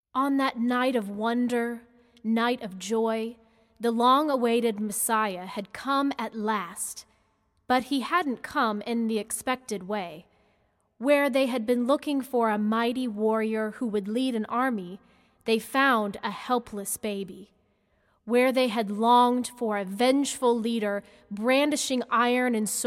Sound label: satb